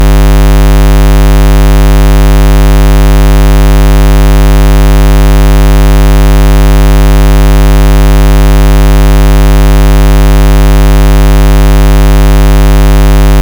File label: 50Hz Rect